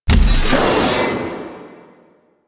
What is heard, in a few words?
Backstab Self Impact; Warframe; Warframes; Pax Duviricus